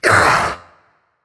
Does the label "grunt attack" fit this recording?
Yes